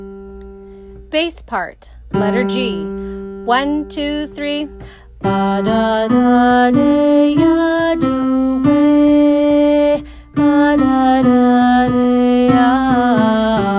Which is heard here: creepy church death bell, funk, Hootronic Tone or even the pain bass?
even the pain bass